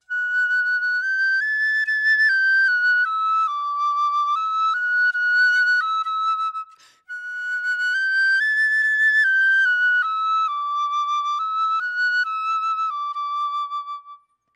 Piccolo

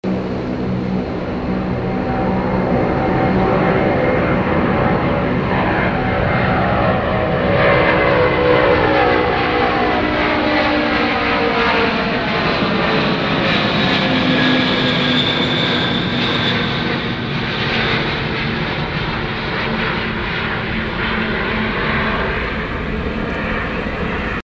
Antonov-An-124, آنتونوف 124

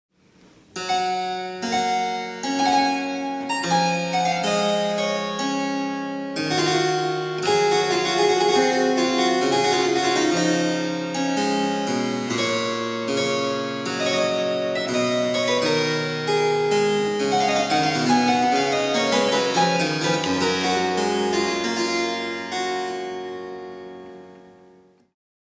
Harpsichord

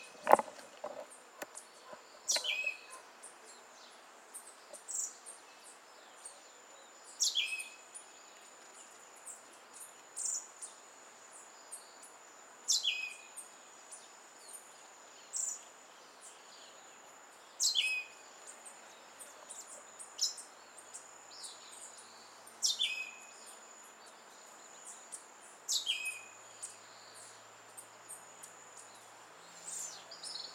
Western red legged thrush

